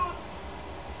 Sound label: no crying